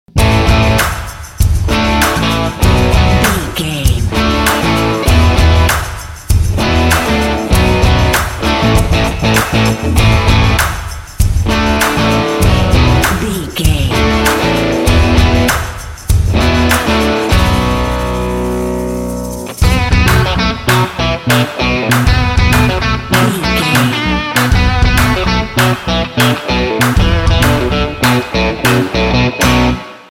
rock machine

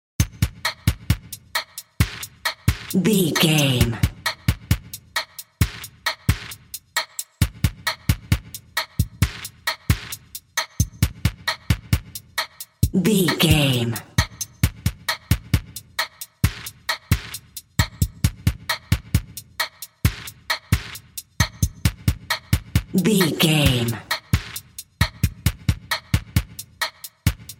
character selection screen video game drums only

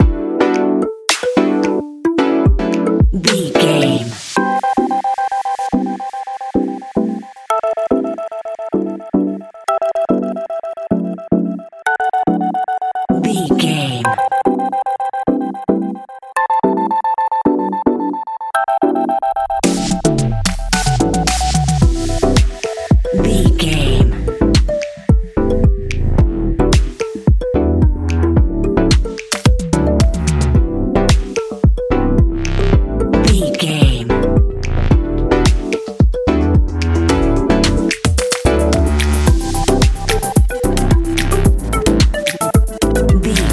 digital joy

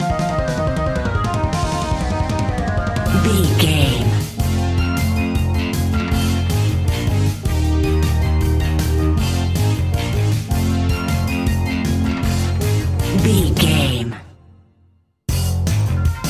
fast 80s synth rock

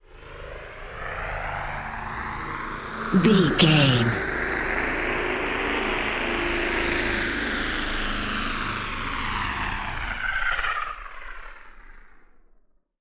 ominous war plane

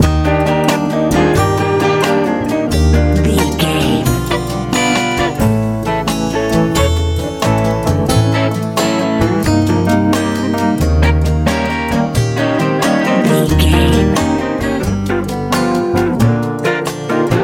bright nice lite pop

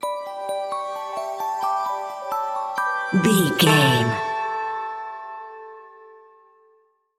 whimsical utopia stinger

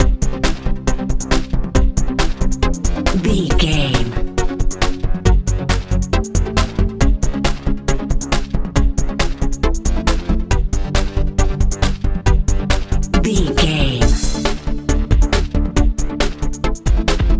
modern dance

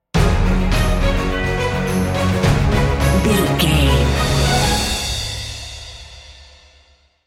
epic cinematic sting bumper; all according plan

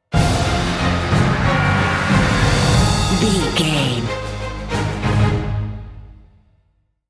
cinematic corporate sting bumper

